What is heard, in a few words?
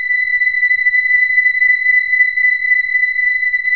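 PAD, synth; AMG - Now CD-ROM (Roland); Old Gold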